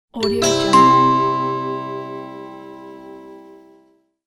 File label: antic game ding